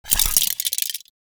Sentient Metallic Move; Enemies; Sentient Movement

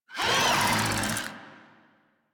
Desert Skate Vocal Attack A; Warframe

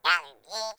Flying Robot Voice; Quests; Veilbreaker; Ambience; Kahl Quest

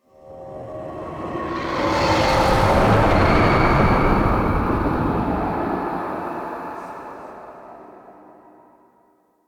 Spectral Whoosh; Warframe; Ambience; Entrati

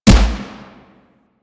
Shot; Warframe; Void Trader

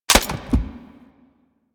Shogun Mech B, Shotgun; Weapons; Warframe; Tenno; Alchemist Shotgun

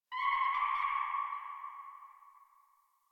Tree Distant Critters, Ambience; Props; Warframe; Duviri

